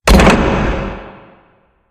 strongman fast swing; halloween; video games; source engine; tf

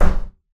wood door knock

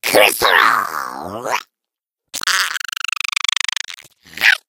eggbot trick fire

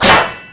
metal sheet impact bullet, physics metal; source engine; left 4 dead 2

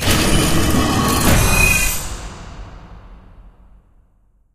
pincer wall reveal down; Source Engine; Portal 2

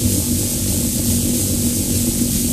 force field loop, energy; source engine; hl2; ambient; video games

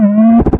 dog playfull; Source Engine; video game; npc

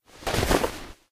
hug, foley; eli; source engine; portal2

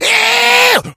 rage at victim, infected, male

